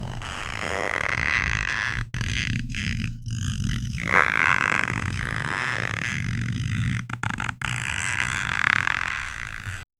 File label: sound of an giant 3, fleshy